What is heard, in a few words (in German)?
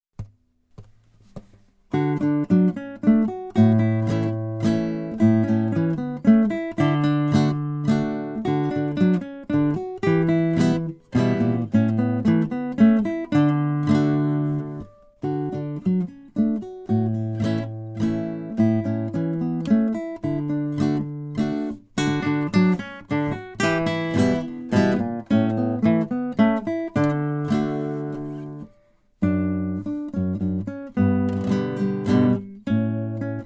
walzer